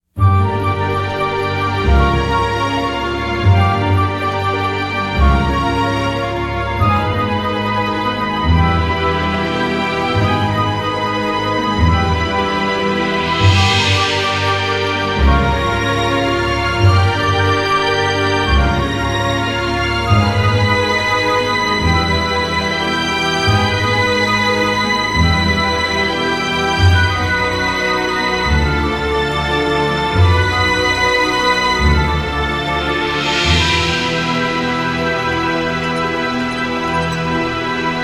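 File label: Campaign Music; Heroes of Might & Magic III HD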